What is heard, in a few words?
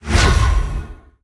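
power arcane end; smite; god merlin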